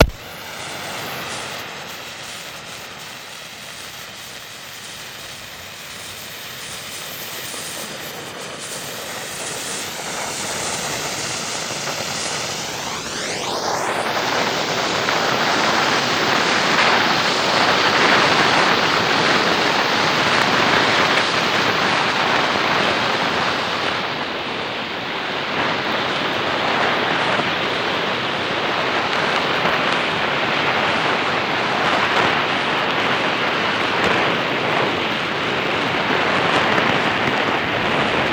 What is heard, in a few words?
Street Flare, Ignite, Burn and Spatter